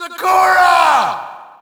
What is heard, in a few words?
Shout, audience; UFC Tapout 2